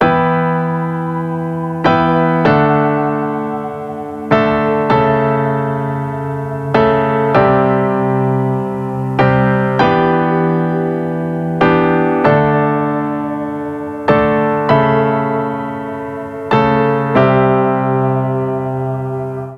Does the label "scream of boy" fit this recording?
No